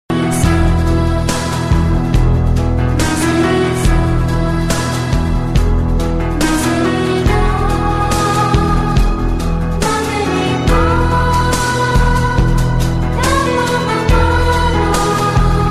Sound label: theme music; blues